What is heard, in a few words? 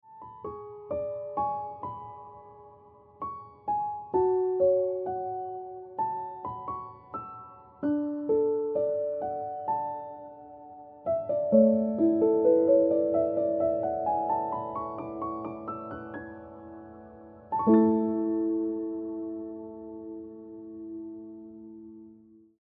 Ringtone Piano Music